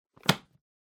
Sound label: Book Close